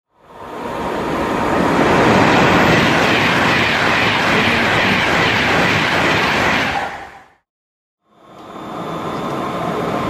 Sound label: high speed train pass by